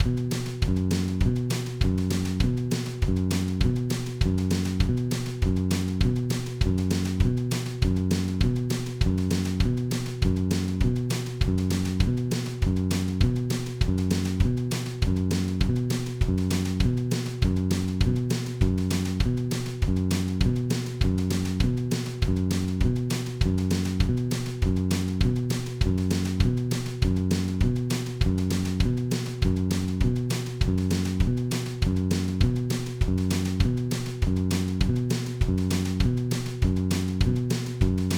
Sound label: crazy chicken pickin lick in b, backing tracks